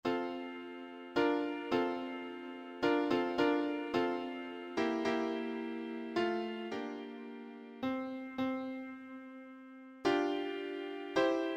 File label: Mohokare audio sample piano; African Composers Edition; Mohokare is about two great natural landmarks of Lesotho: the Mohokare (or Caledon River), and the Maloti (or Drakensbe